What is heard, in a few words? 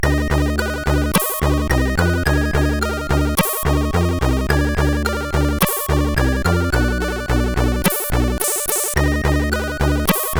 Soundkit, sid CSG8580R5